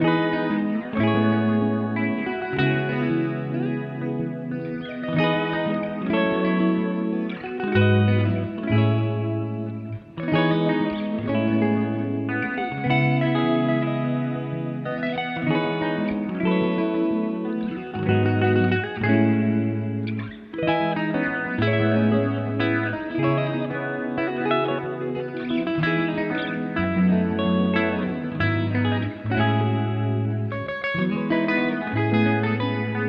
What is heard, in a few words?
Journey Guitar Loops, 93 BPM E Maj; Without You; Cymatics